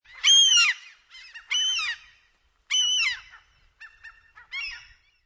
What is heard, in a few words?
Oceanside Seagulls Calling; One sea gull cawing fairly close and few other seagulls cackling further away; Bird; Birds; Lynne Music